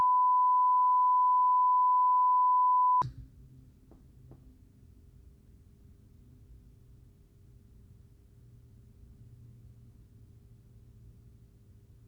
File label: xlr noise line; bmcc; mixpre; noisepro